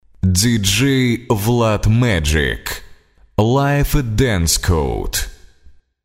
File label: Jingle